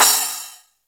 Cym; Roland R-70

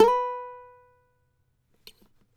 CAVAQUINHO SLIDE, Guitars

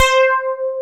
SYN, S CLAVI

